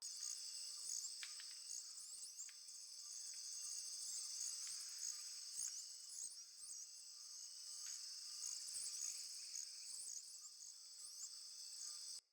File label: Critter Loop, Ambience, Glades; Glades Of Andurin; Critter Loops